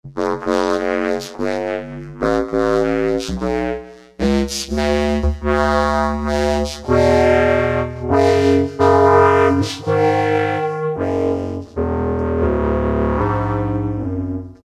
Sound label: Korg microKORG Vocoder SQU; Factory Voices